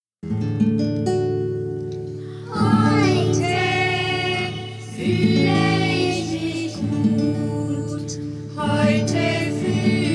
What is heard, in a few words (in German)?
willkomensfeier live mit gitarre